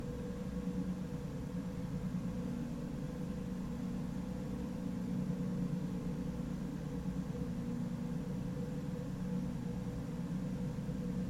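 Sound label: booming close noisy sound of production work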